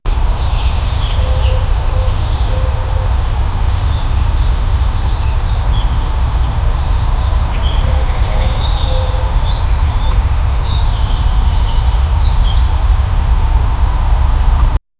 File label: blend in the ambience of, autumn